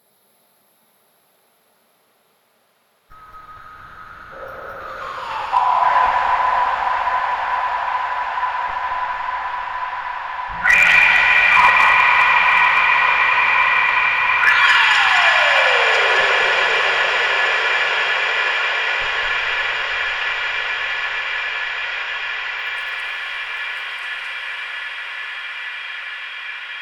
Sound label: Noise Harmony Test